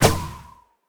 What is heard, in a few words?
breeze jump; mob; minecraft